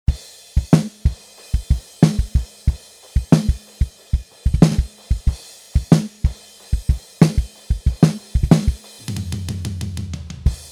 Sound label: drums dreamgate